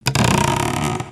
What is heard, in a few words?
Camera Shake; destructibles